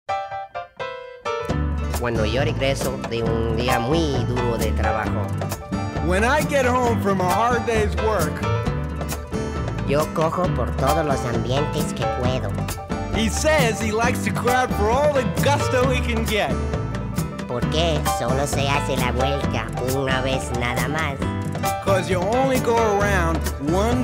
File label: Beer Jingle; Schlitz